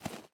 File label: Walking Step